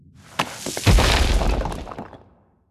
earth Spirit Wild Wipe; elements

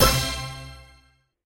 scatter short; spinsane

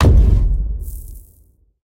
slam hit; godsofgold

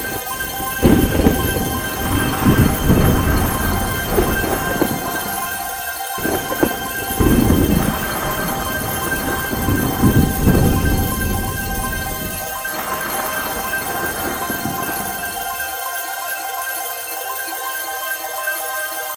bigWinLoop; parthenon

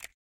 button Hover; serengetikings

